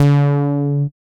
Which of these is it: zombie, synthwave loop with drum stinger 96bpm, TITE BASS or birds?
TITE BASS